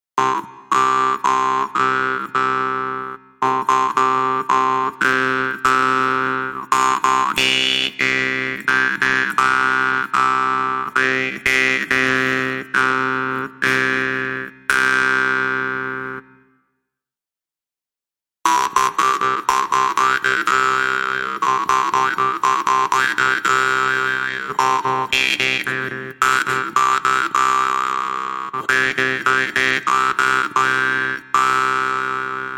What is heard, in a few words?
jaw harp